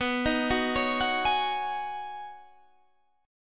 B 6th Add 9th B Guitar Standard, chord; arpeggiated

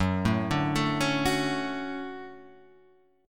D-Major 7th Gb

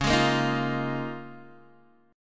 C sharp 6th Add 9th C sharp down Guitar DADGAD

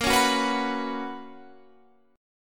B Major 7th Suspended 2nd A sharp, chord shape

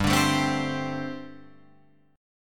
G Suspended 2nd Suspended 4th G, Guitar, Open F; Gsus2sus4 strummed; JGuitar

